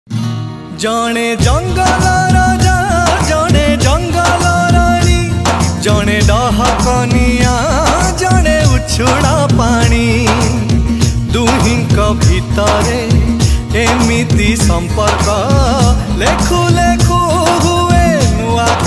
sad song

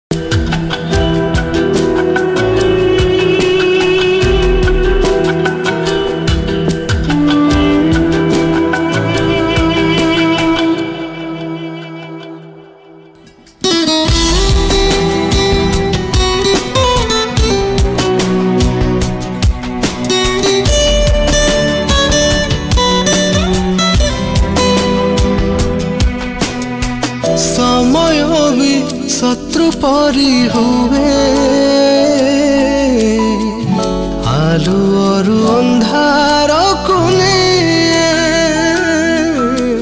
sad version

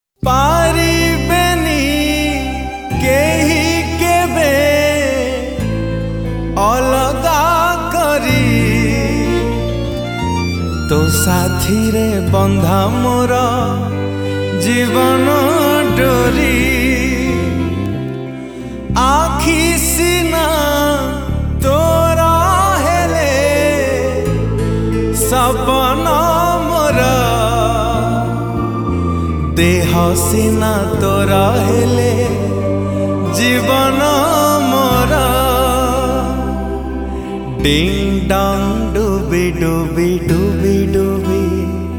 sad version

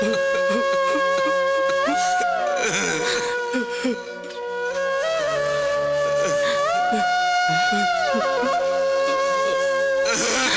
song with dialogues sad; bidaya

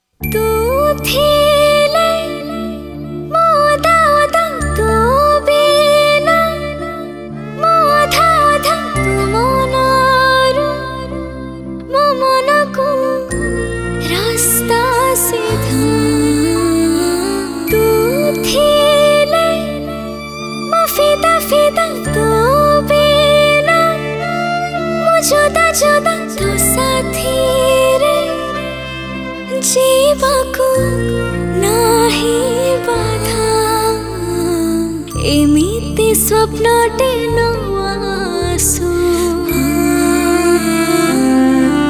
female

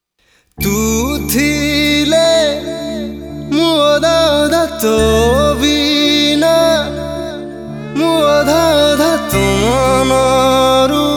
male

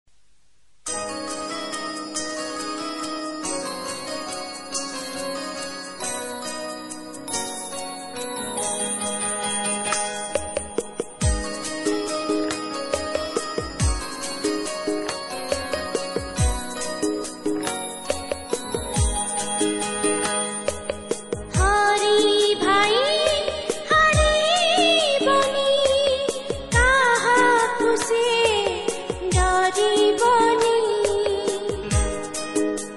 female version

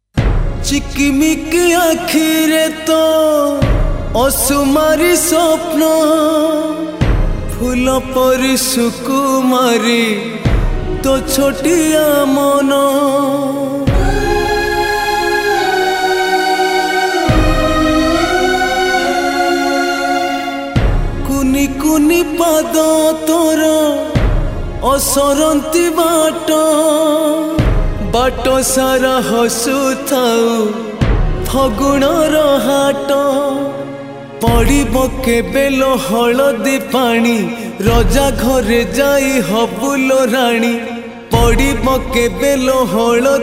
sad version

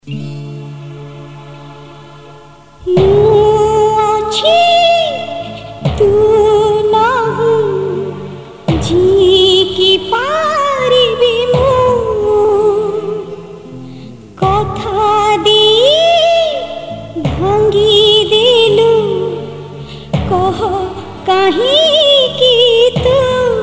slow

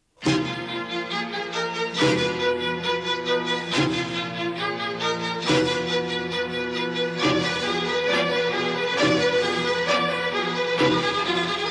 chorus

